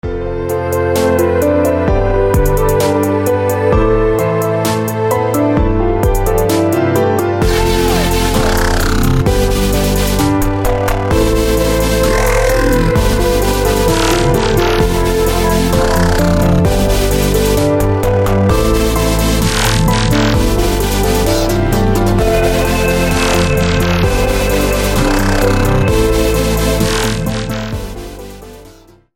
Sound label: freedom best music